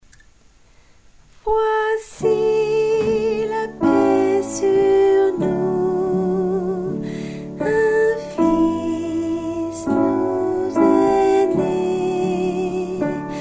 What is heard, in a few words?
soprano